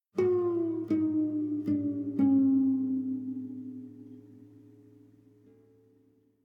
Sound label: Savannah Music